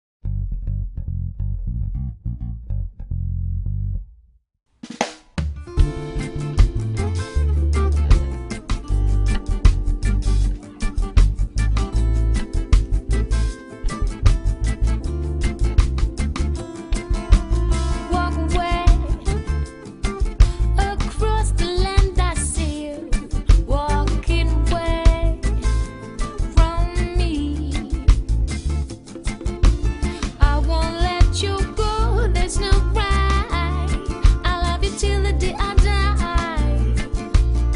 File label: JAZZ BASS